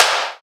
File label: Snare